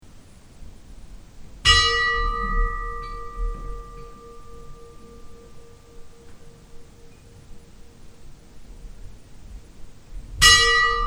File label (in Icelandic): hjardarholskirkja likhringing